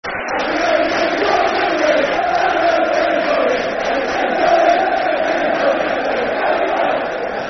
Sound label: Have You Ever Seen The Geordies Win The League, Football Chants (Soccer Song); Chelsea Cfc